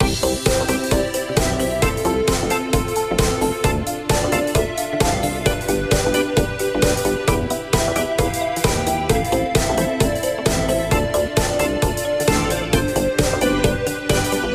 Childish Theme Loop

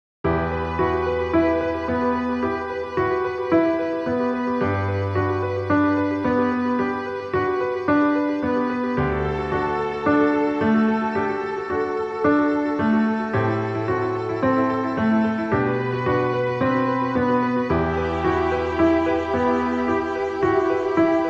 Thoughtful Piano; Tictac9

